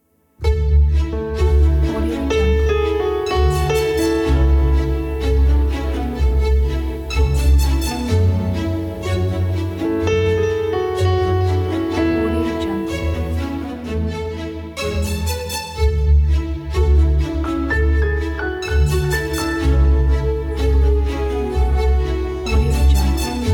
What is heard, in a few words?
classic inspiring